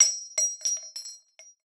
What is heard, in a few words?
casing 40mm; gmod; arccw uc commons attachments